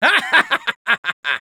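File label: laugh evil, Spanish; Risa malvada 2; Spy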